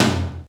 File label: ROOM MID TOM; R50III; Crypton - DrumBase5000